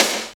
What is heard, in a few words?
SNR, Tekno Industrial; East West